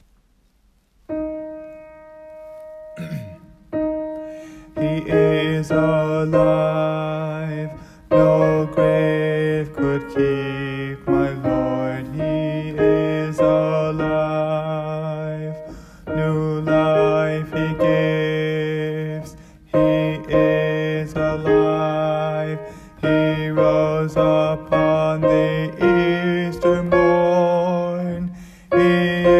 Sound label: Alto Chorus